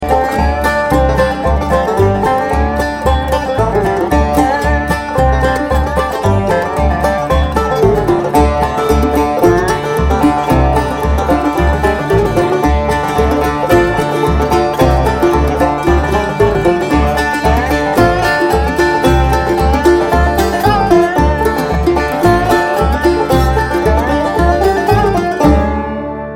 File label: Cluck Old Hen, banjo